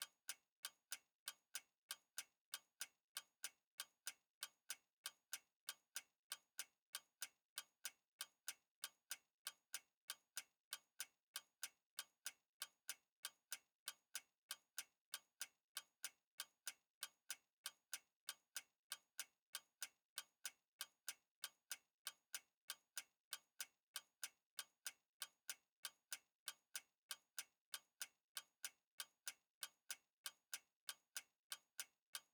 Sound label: Clock Ticking; HauntedBloodlines; SFX Producer